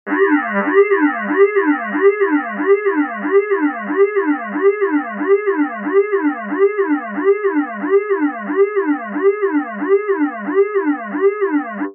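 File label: Alarm Security Breach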